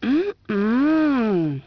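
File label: female yum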